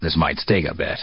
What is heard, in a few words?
med sting; ops